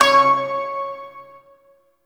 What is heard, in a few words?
Shawzin Oct Three C Sharp; Warframe